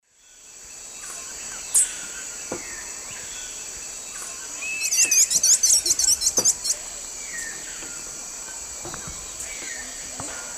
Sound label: Tersina viridis, calls and song; S. America; Eastern Lowlands